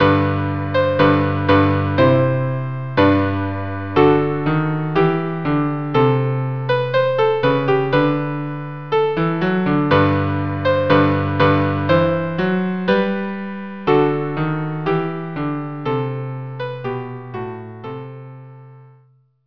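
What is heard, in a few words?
viola cello duo; St Anthony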